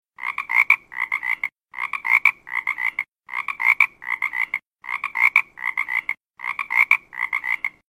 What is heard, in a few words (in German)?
Klingelton Frosch